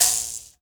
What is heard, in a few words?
MOUTH BOW, Heart of Africa